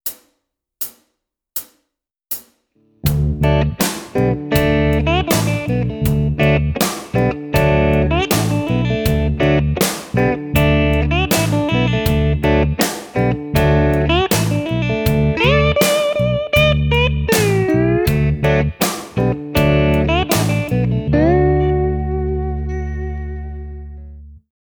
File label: Slide, Guitar; Guitar Techniques 2018 Issue 289 December